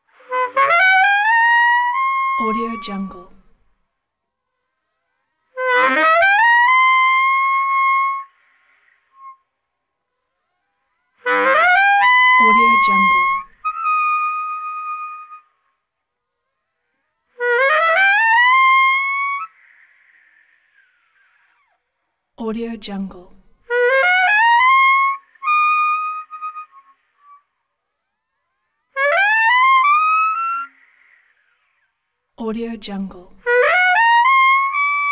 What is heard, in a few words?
baritone saxophone high harmonics